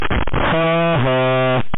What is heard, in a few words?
laugh